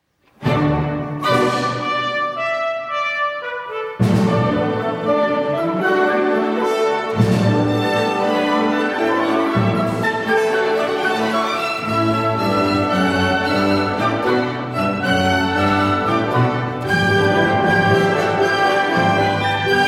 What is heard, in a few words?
Cello Concerto, Allegro Moderato, Live